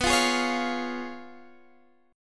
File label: Db 7th Cb; Db7/Cb; strummed